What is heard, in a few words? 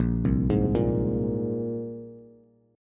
Bb 7th Suspended 2nd down, Bass; Bb7sus2; arpeggiated; JGuitar